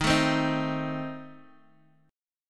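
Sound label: A Diminished E flat, chord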